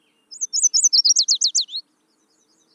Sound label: warbler